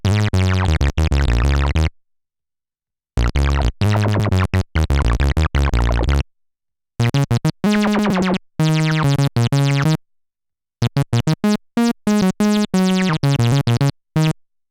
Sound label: moog squelch